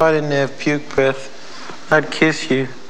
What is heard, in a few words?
Puke Breath